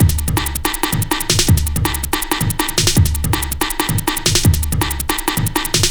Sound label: breaks, Loops; M8